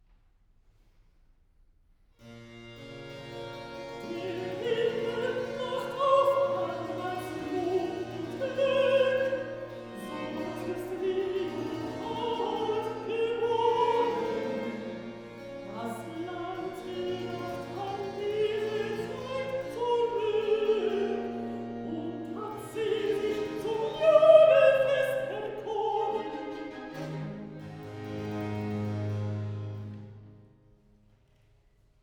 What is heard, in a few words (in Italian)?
Recitativo Alto; serenata